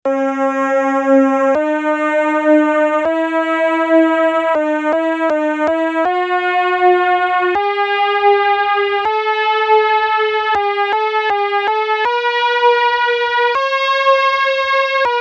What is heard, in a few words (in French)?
gamme mineure zarlinienne